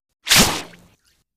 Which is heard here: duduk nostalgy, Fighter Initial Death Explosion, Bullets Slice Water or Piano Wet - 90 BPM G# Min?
Bullets Slice Water